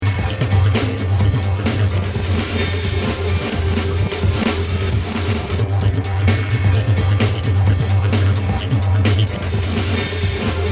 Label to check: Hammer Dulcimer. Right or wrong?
Right